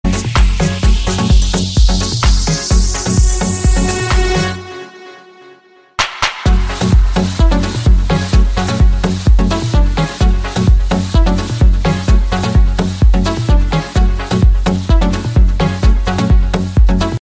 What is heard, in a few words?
arabic deep love ringtone